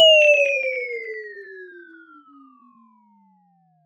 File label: Retro Descending Short